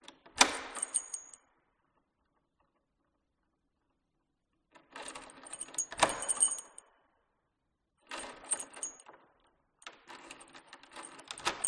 Door Abandoned Mansion Hallway Perspective Reverberating Old Wooden Door Lock; skyes audio